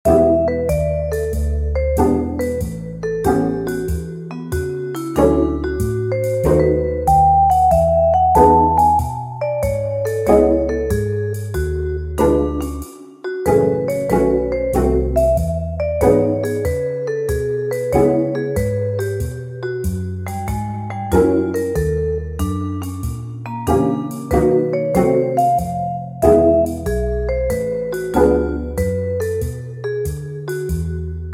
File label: Jazz Chromatic